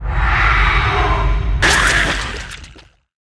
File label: Leviathan Fear; Seer; GamigoNA